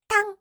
tann

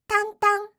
tann tann

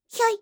hyoi

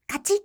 kachi

